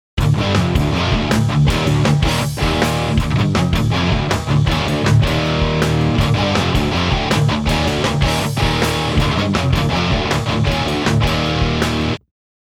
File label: Crunch